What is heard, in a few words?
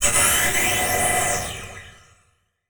Transition Whoosh Laser; Production Element